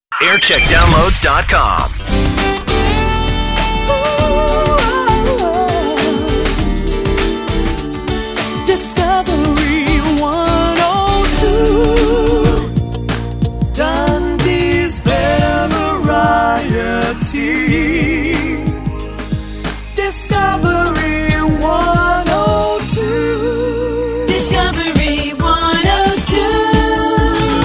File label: jingles; discovery 102